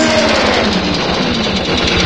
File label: digger grinder stop, vehicles; Source Engine; video game